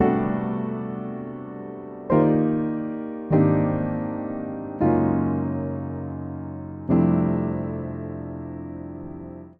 Progression C13-Eb9-Ab#11-Gb9-F9 100 bpm, Upright Piano; MIDI Clips; Blue Umbrella; Upright Piano by Spitfire Audio; Ableton; Factory Packs